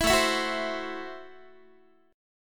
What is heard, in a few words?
A Minor 6th A Guitar; 19,17,19,16,16,x; strummed; Am6